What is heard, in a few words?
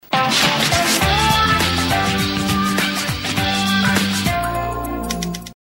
Jingle